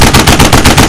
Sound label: 30cal loop; weap 30cal loop